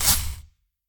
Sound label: Samurai Shoot; Risk of Rain